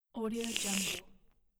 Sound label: tea sip